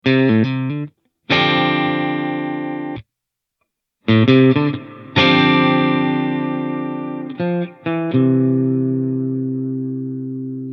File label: Spring Dark Long